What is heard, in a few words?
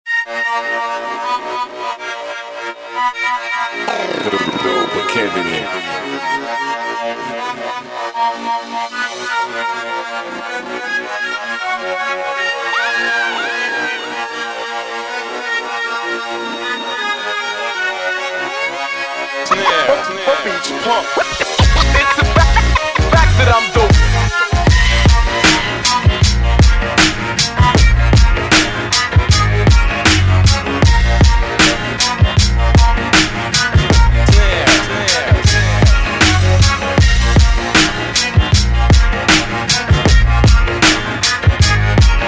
Blues